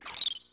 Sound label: key appear